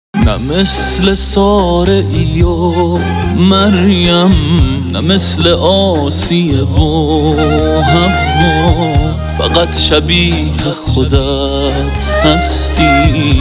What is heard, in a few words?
ringtone